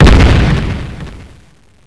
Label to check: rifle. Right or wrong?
Right